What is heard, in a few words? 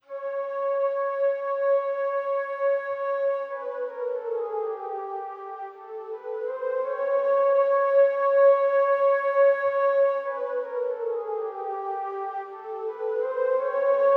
far mic; Heavyocity